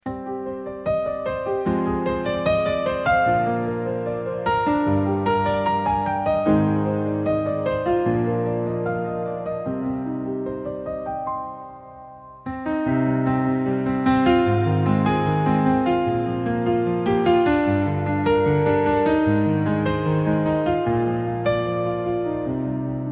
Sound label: Piano Softly